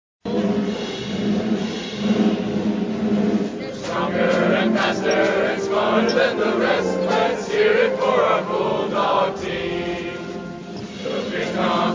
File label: Fight Song vocal; UNC-Asheville; Bulldog